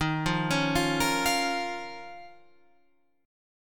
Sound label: Eb 7th Suspended 2nd Eb down Guitar Open F; Eb7sus2 arpeggiated; JGuitar